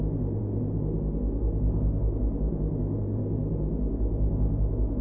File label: cockpit